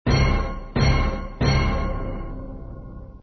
orchestra; Win Ghost 3.00

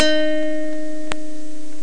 acoustic guitar; Sound Effects for Multimedia - Volume 1; string instruments

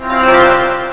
special piece alert; Columns Max 1.1

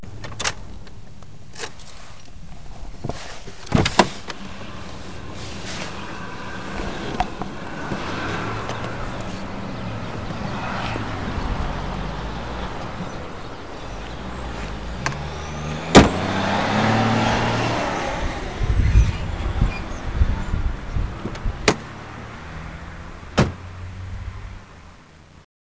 traffic

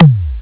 dx toms; drums

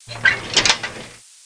File Cab

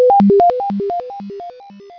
Grid Appears; Grid Warrior™ Demo

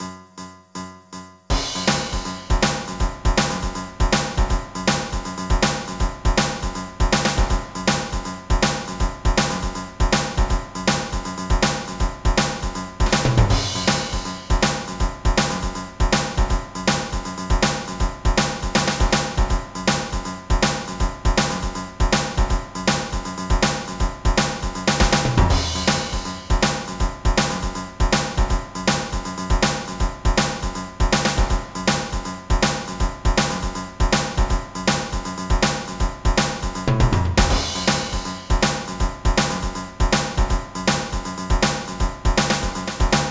SHUFLE

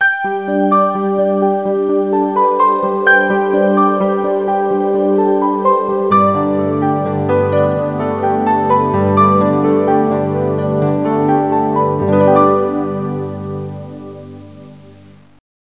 mello; Tandy Sensation II

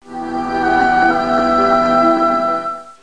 Violin; Querkz 1.5

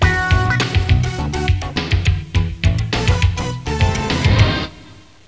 wahwah

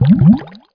bubbles; OutLaws

